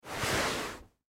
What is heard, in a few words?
Couch Cushion, Couch Cushion Remove Furniture; Living Room; Household